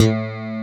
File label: DIGITAL BASS, BassGuitar; AKAI S6000 CD-ROM - Volume 1